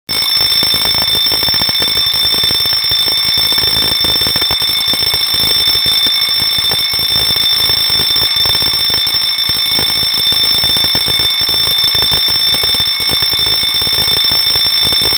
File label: FIREBELL